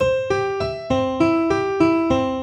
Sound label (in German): C Dur zufaellig